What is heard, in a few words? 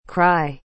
cry